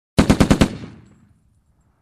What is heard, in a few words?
Short Machine gun fire